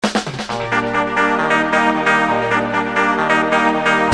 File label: tune